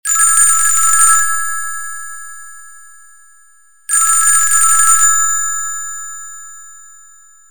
Electric Phone, ringtones; NGM WeMove Miracle

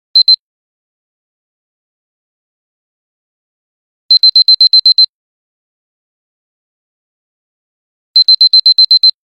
Beep Alarm; Nokia; 515; Alert tones

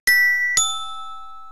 Low Battery

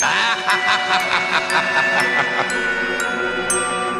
Funny; Google; NewWave Labs

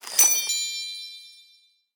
holiday magic, notifications; Google; Google Sounds

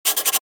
Keytone sharp; LG SV850 Wine 4

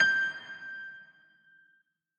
dial tone piano pound; Oppo Neo 5

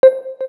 KEY XYLOPHONE 9, keytone; Samsung SGH-T369 Linx

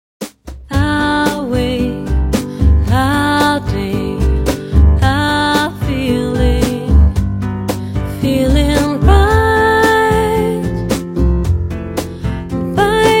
Nokia 3600 slide Ringtones; Our day

